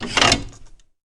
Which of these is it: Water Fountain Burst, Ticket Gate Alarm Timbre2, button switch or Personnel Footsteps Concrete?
button switch